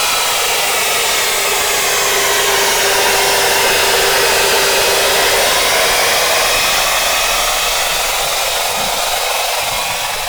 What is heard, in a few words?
Broadband Sound